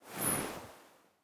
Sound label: armor; smite; olorun